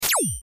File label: Laser Gun; lasers